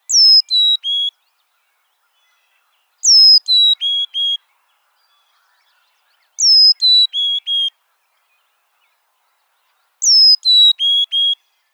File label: Poecile carolinensis; Chordata; LandBirds